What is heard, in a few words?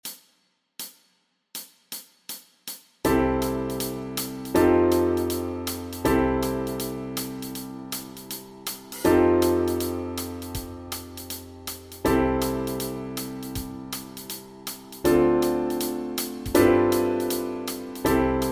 Backing Track, walking bass